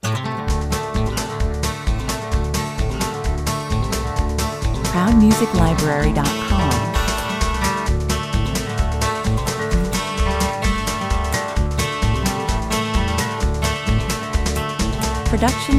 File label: A melodic country track with a train-like brush drum rhythm, 130 bpm; featuring Dobro top line